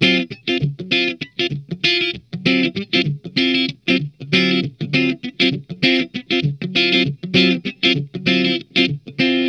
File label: Funk Guitar; RARE TRAIN; Zero G - Funk Guitar